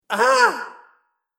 Ahh Man Yells; Screaming Sound Effects; Human

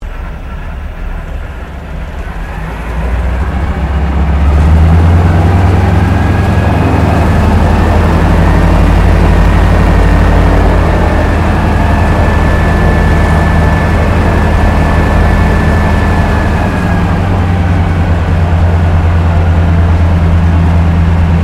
AIRCRAFT AT 6D HARVARD MK III TAXI INTERIOR; Temora Harvard MK III; Airplanes; Vehicles; Airplane Sound Effects

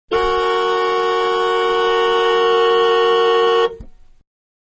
Big Car Horn Long Interior; Cars; Vehicles; Manhattan Production Music

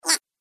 Gremlin Objection, monster; Monster Sound Effects; horror sound effects

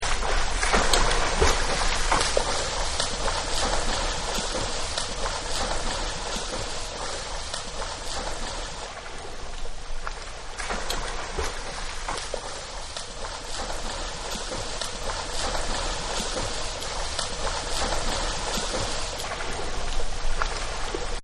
Swim Laps, Pools; Backgrounds

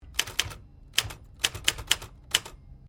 Typewriter 1950s Sequence; Old typewriters; Typing; Offices; Typewriter 1950S Sequence 04

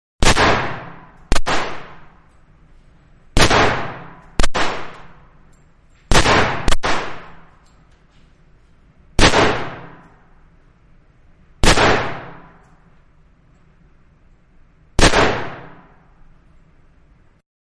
Guns Gunfight 9mm 357 alternating; Pistols; Guns Fight 9Mm And 357 Alternating; Sound Effects:Guns; Manhattan Production Music

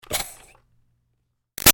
Steam Release Iron Puff; Miscellaneous Household; BigRoomSound

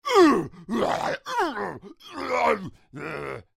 Creature Strongman Fight Grunts; Groaning; BigRoomSound

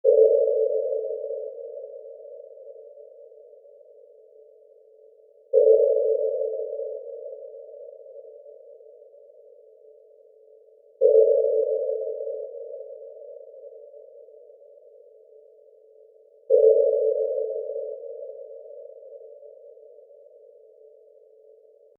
Sonar Pings SciFi Warfare Submarine Classic; Marine Technology

